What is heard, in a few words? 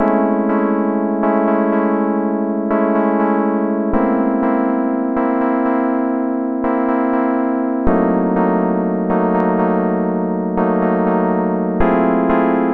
122 BPM Tonic E Fmaj Dmin Chord Progress; mmb chord progressions essentials